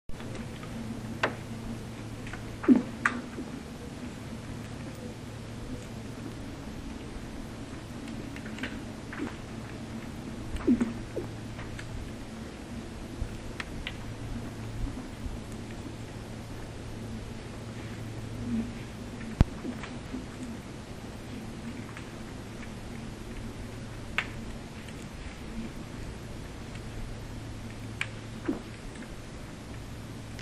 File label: ice growth